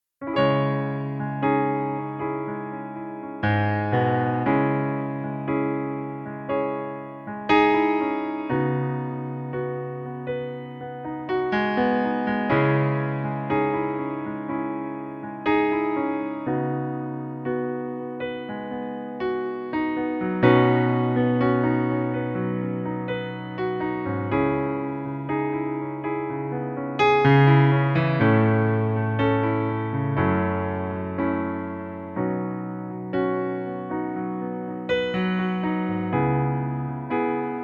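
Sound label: piano playback C# minor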